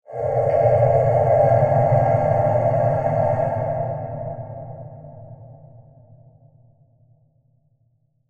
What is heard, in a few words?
Mysterious Breathy Transition; Scary Soundscapes; Horror; Sound Effects:Horror; CraftedSignals